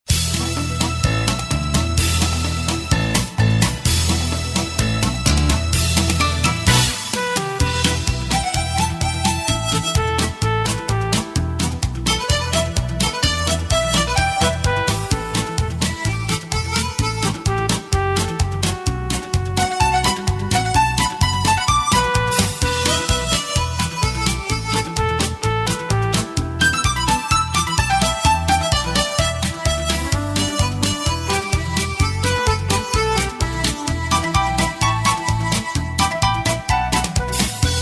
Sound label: music